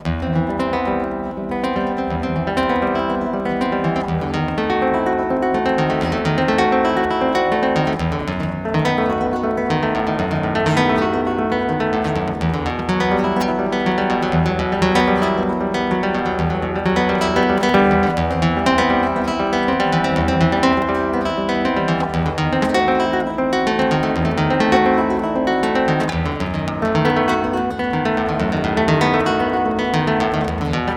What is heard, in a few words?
Guitar Loops